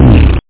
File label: PFFFFFRR; PSION CD 2